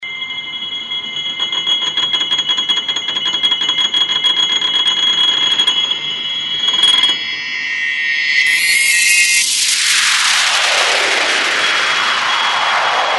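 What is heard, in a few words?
piano light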